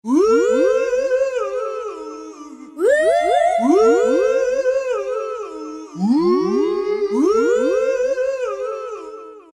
Whoooooooo, Roland SR-JV80-06 Dance

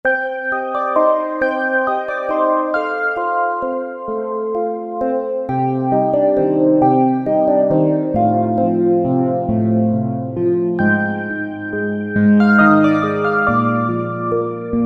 Ana Bells

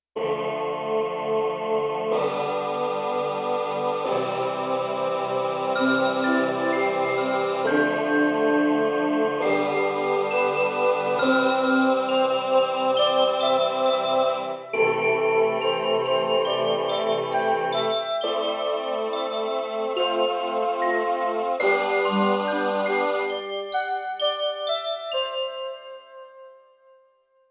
Vocalism, Yamaha TX16W; Orchestral Performance 2; Vocalism & Fantasia

